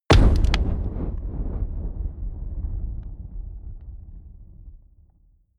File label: fire impact long; explosionen; comedy-cartoon; soundpool